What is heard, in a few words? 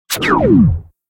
off, sfx